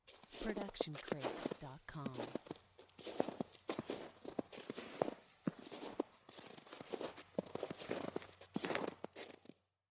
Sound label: Snow Soft Walking; SoundsCrate